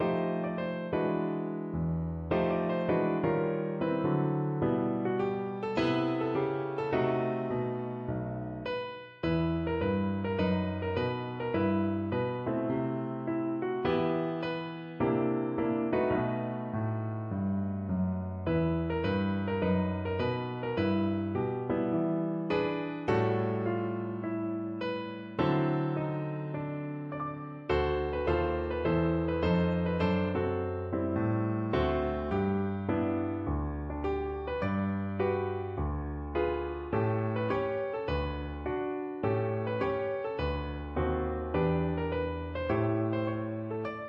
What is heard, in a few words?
shine on, piano